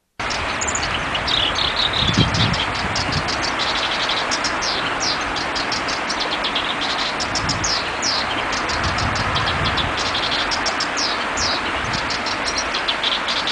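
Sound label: black-browed reed warbler; koyoshikiri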